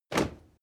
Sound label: step crowd march loud single right, footsteps; schritte